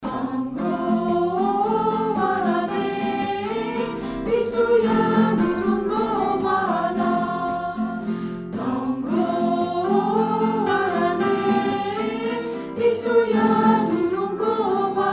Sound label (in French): Canon Hawaien